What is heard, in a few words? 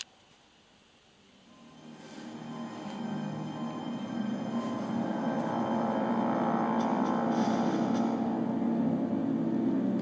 drumroll